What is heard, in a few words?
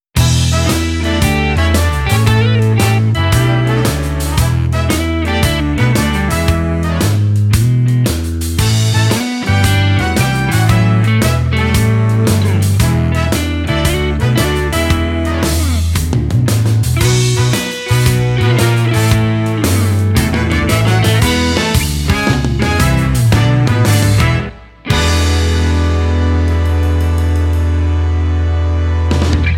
long BPM114